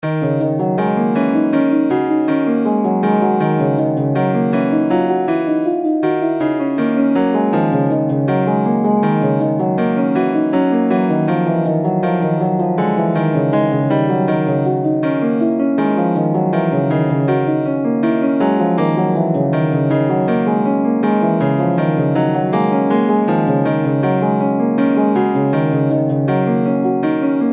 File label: Piano String Duet